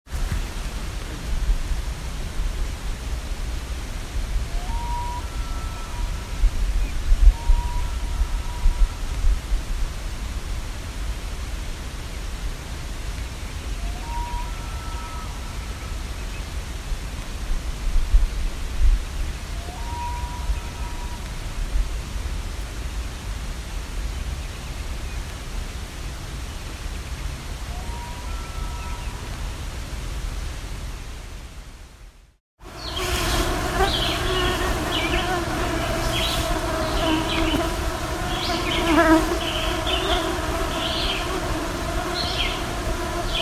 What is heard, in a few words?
owls bugs frogs loon